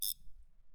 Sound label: Fishing Reel